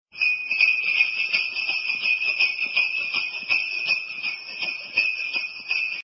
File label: christmas sound